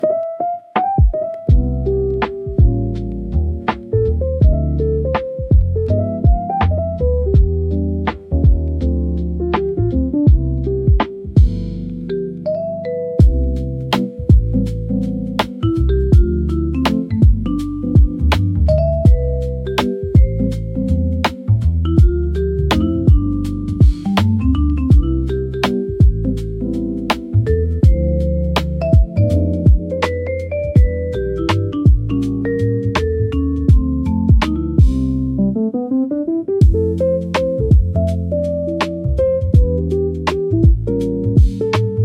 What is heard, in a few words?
Cafe Jazzhop Focus